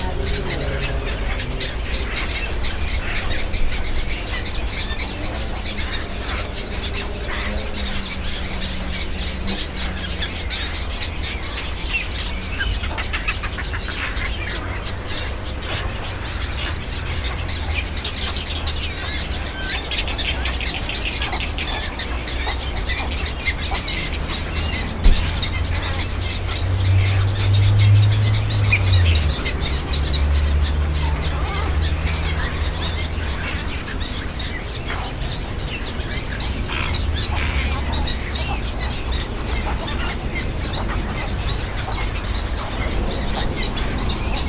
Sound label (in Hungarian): pásztorgém, Arrecife; lanzarote 2017; allathangok